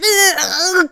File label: Unmerge; Link un-merging from a wall for the first time (struggling and falling); zelda